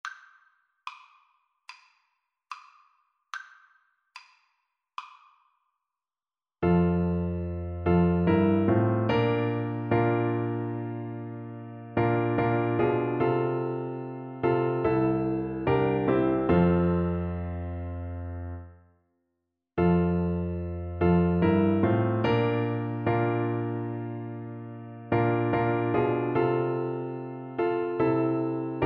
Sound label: Oboe